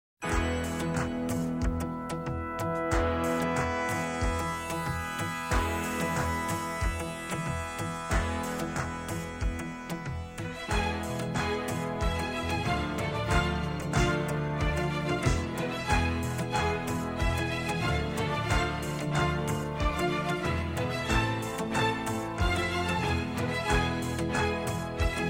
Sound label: Instrumental; PBI Records